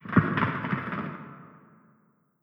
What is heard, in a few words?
building rubble; War Ambience